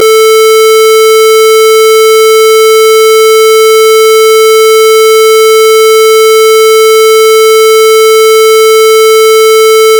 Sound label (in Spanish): 440 cuadrada; 440 Hz; Onda con forma cuadrada de frecuencia 440 Hz; Física General II